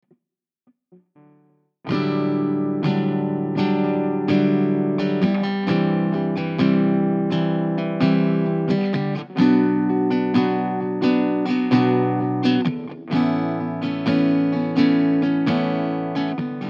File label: D128 Strummy Song Chords